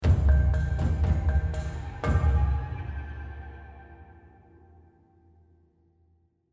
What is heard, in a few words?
Tension End; The Last of Us